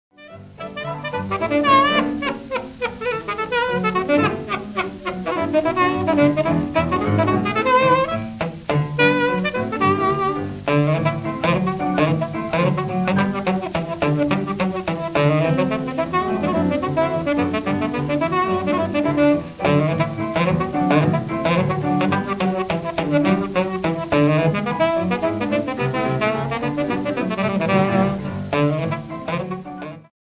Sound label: saxaphun